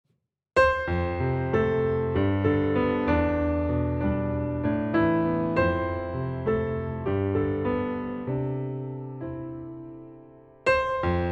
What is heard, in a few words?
PIANO Rubato